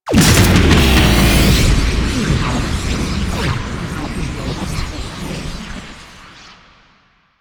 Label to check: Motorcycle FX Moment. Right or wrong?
Right